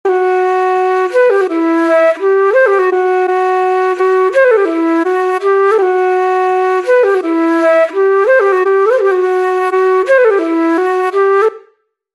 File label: Flutes; Drumify; Flutes Pack Vol.2; Multi Kit